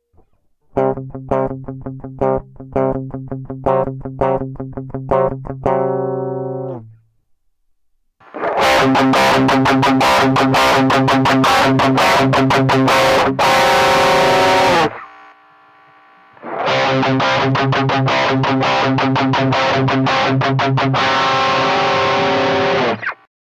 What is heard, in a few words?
Amp Solver